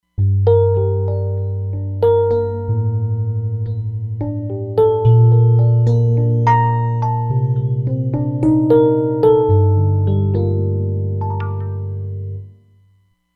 FXM EP

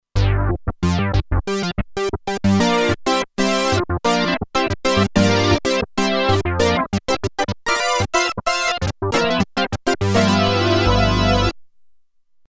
Funk Syn, Roland Alpha Juno-2; Factory 2

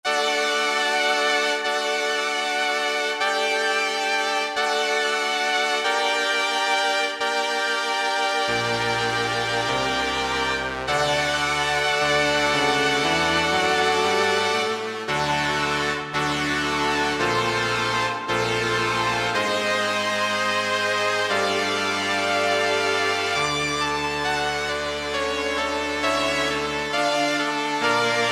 Very Old Str, Alesis Z5 Vintage Synthesizers; Vintage Synthesizers And Classic Beatboxes

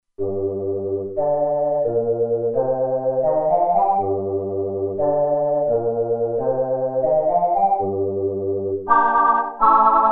Vocorder, Roland Alpha Juno-2; Original Sound Chart patches

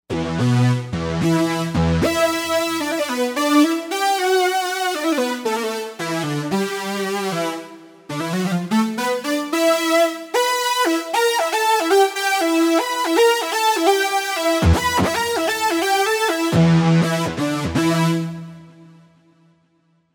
Glider lead; Novation UltraNova; Factory Patches

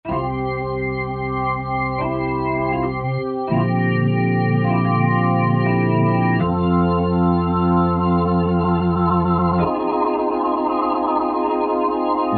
Wheel D-bar, Roland SRX-03 Studio SRX

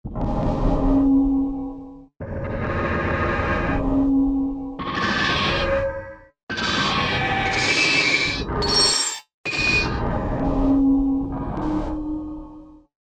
Super Gate, Korg MSC-1S; Korg MSC-1S Variety

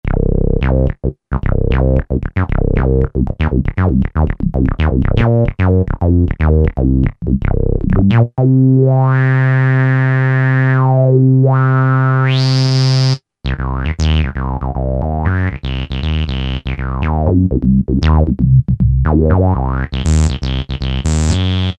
Fish303, Yamaha DJX Voices

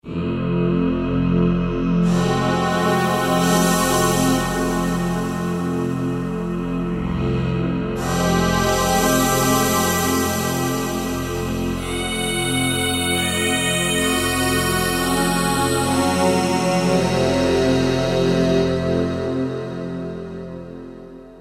Waveola Vox Rize, Roland JD-990; Factory Patches

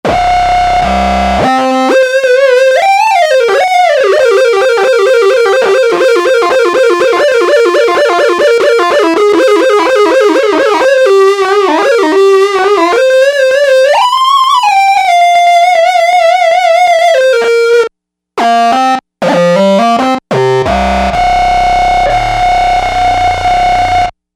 Bass 2

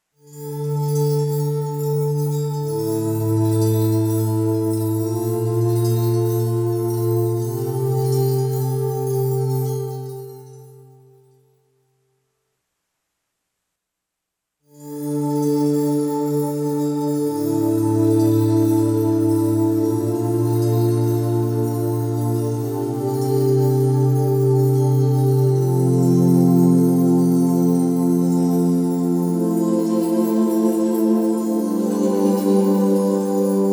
3.0 Sec. MEDIUM DARK, MidiVerb

